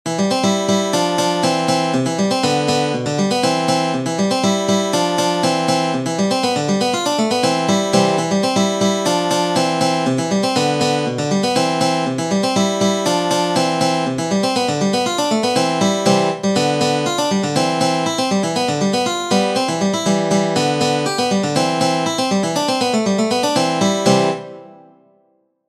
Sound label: polka, guitar; Arling Shaeffer; kiss polka